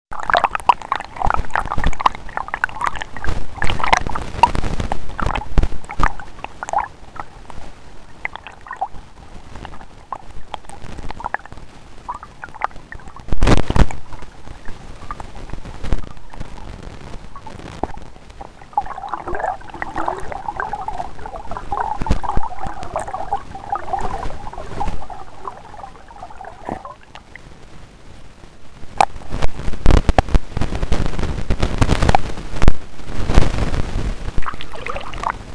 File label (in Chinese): 茄苳溪河中聲音